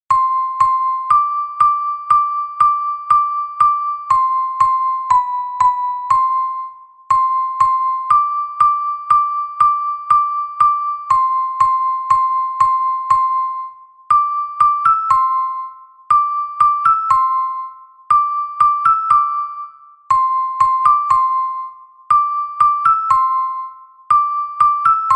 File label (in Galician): Metalofono Soprano